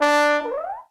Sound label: TROM DOIKS